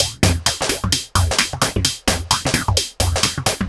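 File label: Percussion, Drum Loops 130bpm; Zero-G; Soundclash Nu Dance Electro; Acidized Wavs; Chicago Love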